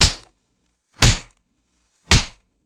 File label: two sharp fleshy skin slaps